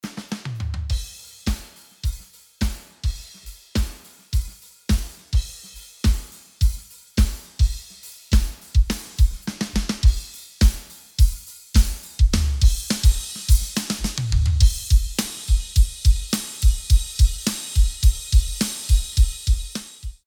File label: Drums; wesaudio; pultec shootout